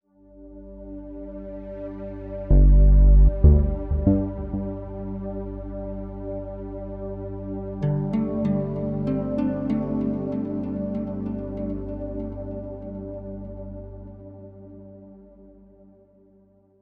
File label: Inspection -Jingle-